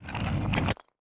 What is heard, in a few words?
shulker close, chests; mcl chests